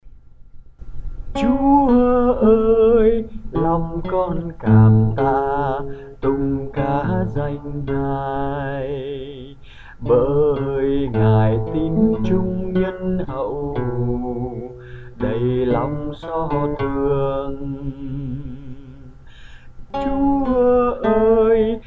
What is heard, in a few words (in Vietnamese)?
Thánh Vịnh 137, bè Thấp